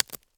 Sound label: SNAP Twig; mono; Universal Sound FX; BREAKS & SNAPS